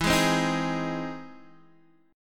E Augmented Major 7th E down Guitar Half Step Down